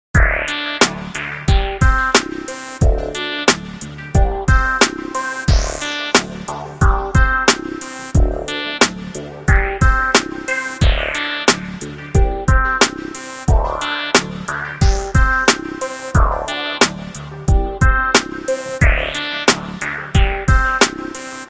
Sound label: techno